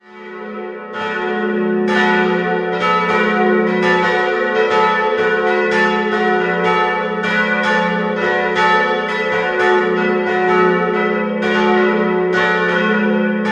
Obereichstätt, Pfarrkirche, Glocken; St. Johannes Evangelist; 3-stimmiges TeDeum-Geläute: fis'-a'-h'; 1950; in Passau gegossen; Rudolf Perner

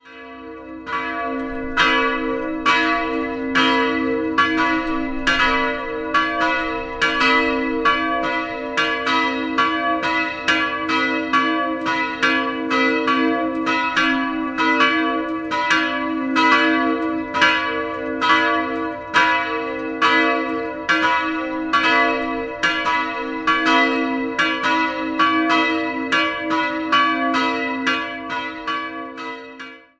Imbath, Glocken

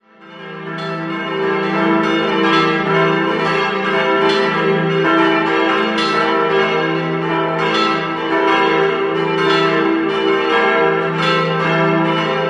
Dietkirchen, Glocken

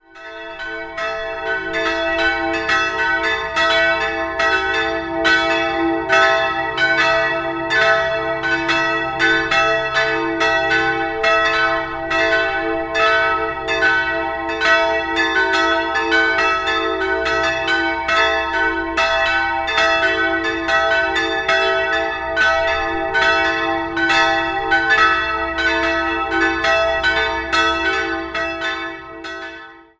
Katzdorf, Glocken, Pfarrkirche; Pfarrkirche Michael; 3-stimmiges TeDeum-Geläut: e''-g''-a''; Alle Glocken wurden 1955 von Georg Hofweber in Regensburg gegossen.

